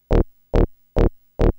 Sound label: Bass cut; Techno; TechnoMusicMaker